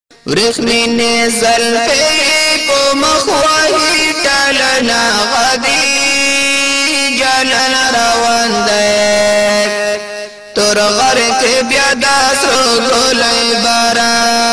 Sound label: Pashto Ringtone